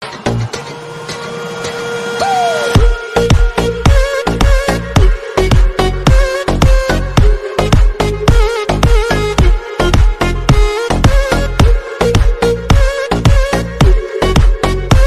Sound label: Tik Tok Remix Ringtone